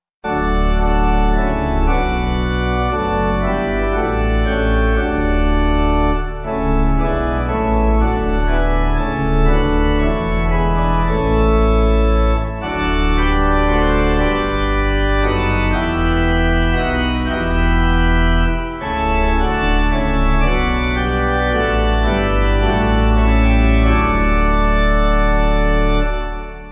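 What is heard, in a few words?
Pipe, Pipe Organ backing; Be With Us; Antwerp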